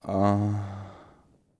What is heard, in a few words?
groan; Singles; French Version